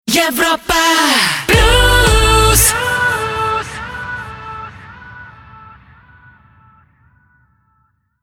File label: Jingle Rapid Fire; Europa Plus